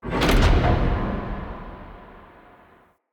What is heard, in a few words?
Wall Gate Stop B; Movers